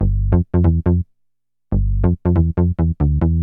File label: Bass G; Techno Music Maker